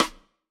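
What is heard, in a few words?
Snare Stick, drum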